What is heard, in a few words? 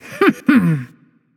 "heh heh"; smite; voicepack; nike